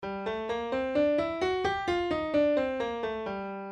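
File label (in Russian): Соль минор мелодический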